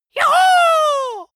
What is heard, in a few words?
dwarf cheer "juhu"